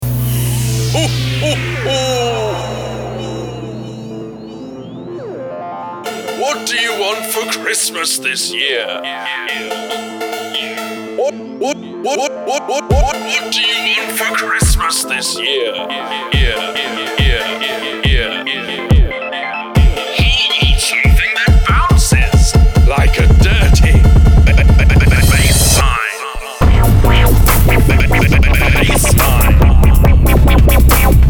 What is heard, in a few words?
Dubstep Santa